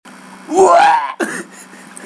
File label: uweaaah